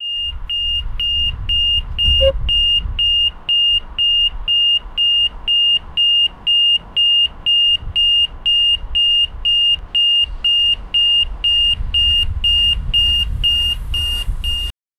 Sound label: the british crossing sque, traffic light